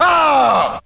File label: "ha", male; ooh ahh